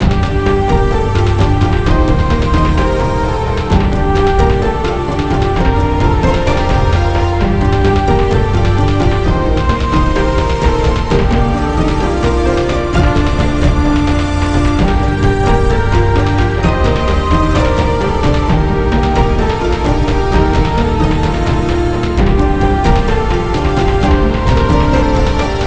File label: music finslow; mcdemo